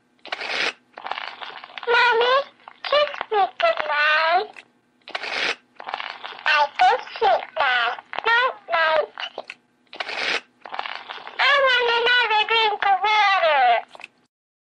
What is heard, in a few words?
old talking doll sound effect